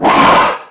Bird Monster Gasp; Playable Tutorial Level; TR2

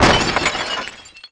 Metal Shutters Smash; Playable Tutorial Level

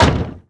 Vehicle Jeep Door Close; Playable Tutorial Level

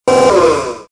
Claw Motor; Playable Tutorial Level

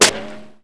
Horse Ricochet; Playable Tutorial Level

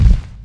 Foot Steps; Willard; TR3; Playable Tutorial Level

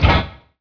Jump Pad Up; Playable Tutorial Level; TR2

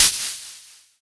Pyramid Pneu; Playable Tutorial Level

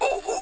Get Up; TR3; Playable Tutorial Level

